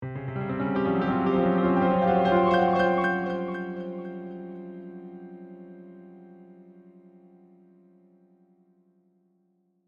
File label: Piano Dark, Flourish, Mysterious; Horror, Musical, Accent, Piano; Scary Tone; BlastWave